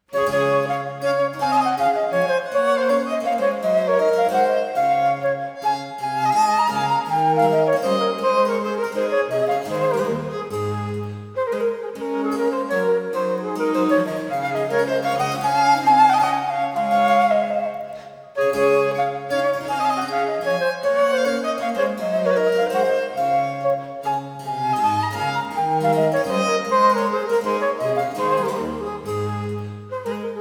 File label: Sonata D-dur Courante; Hotteterre; op 3 No 2 movement 2